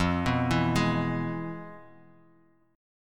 F Minor Major 7th Flat 5th Guitar Half Step Down; FmM7b5

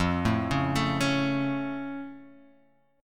F Augmented F Guitar Half Step Down

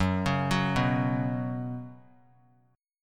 Gb Suspended 4th Gb, down; arpeggiated; JGuitar

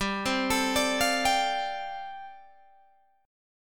G 7th Suspended 2nd Suspended 4th, Guitar Open G

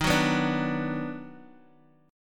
Eb Minor Major 7th Sharp 5th, down; strummed; chord; JGuitar

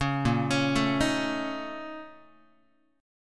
A sharp Minor Major 7th Double Flat 5th, Guitar; arpeggiated; 4A,4E,5A,5D,5G#,6C#; 4,6,4,7,7,x; JGuitar

